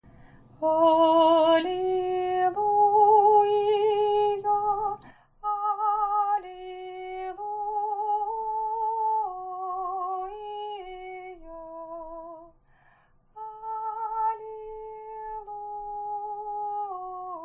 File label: halleluja after communion, singing